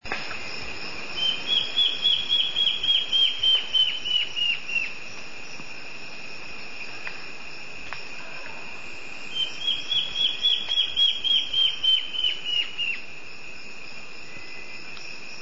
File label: black faced antbird; Peru